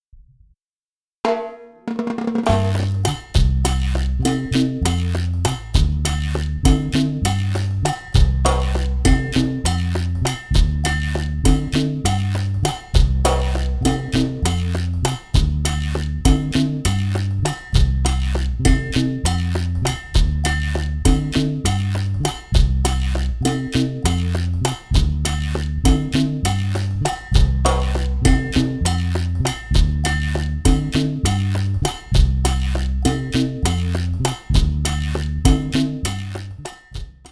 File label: Chachacha